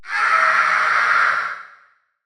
megaspud hit pitch

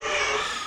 land idle; toxifin

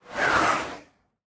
idle air, breeze; mob; minecraft

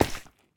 resin break; block; minecraft

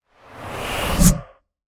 bad omen, mob effects; minecraft; event

